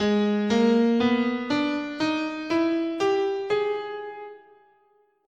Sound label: Hungarian Gypsy, scale, guitar; Gsharp